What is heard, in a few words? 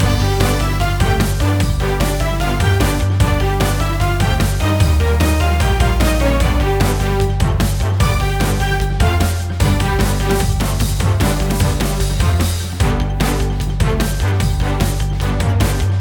Slime Mania Menu; Nickelodeon - Capture The Slime